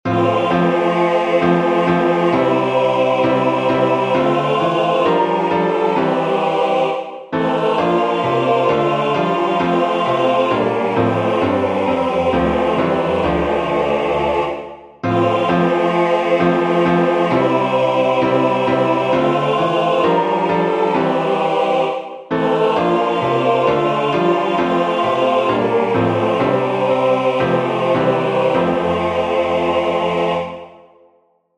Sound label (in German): Hymne tutti; Lionsclub Mattsee-Trumerseen